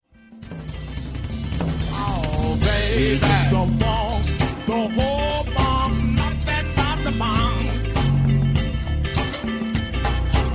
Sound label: Funk Scat